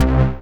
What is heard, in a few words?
Synth Hit C